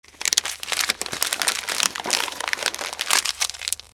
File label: Plastic paper crumpling rustling